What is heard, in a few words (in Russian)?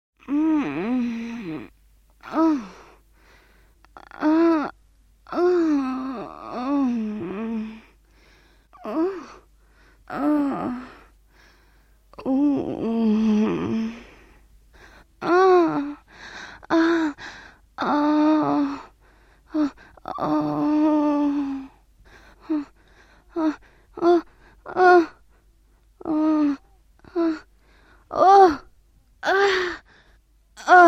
zvuki zhenskikh stonov